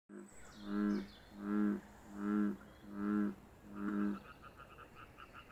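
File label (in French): Chant mâle; clement fauconnier; CDPNE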